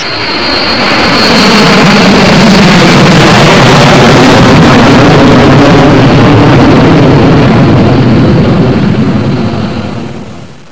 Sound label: jet